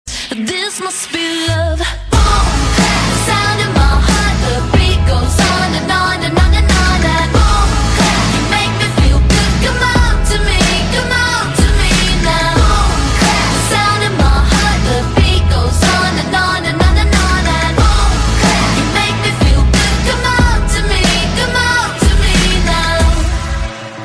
boom clap

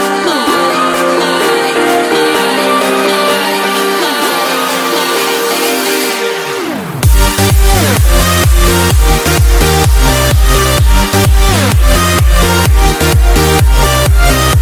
Beatdrop

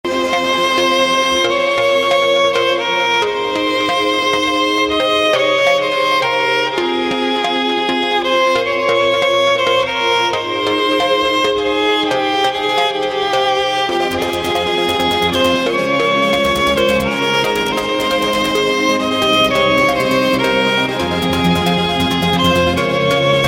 Nice Tone

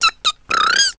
prrrriiiii

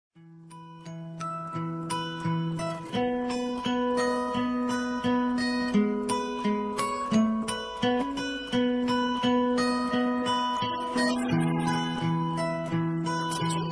karaoke instrumental

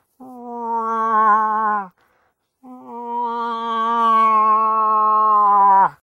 Moose Call

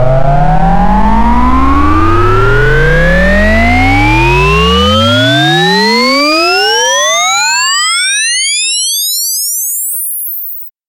SUPER SIREN

